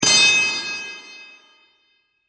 anvil wood

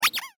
SQUISH

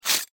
knife hit

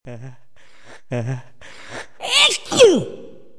Sneeze

